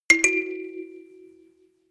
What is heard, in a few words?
notification kalimba rattle second up